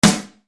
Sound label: ENGLAND SNR, Yamaha RY-30